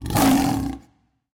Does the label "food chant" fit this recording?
No